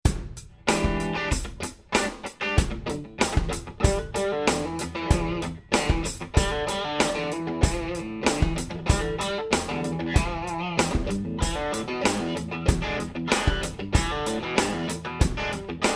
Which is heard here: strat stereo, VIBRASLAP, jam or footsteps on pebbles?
strat stereo